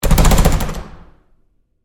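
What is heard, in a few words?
Door Bathroom Stall Handling close-up Rough Short; Household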